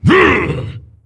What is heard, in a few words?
Attack Light; Jaraxxus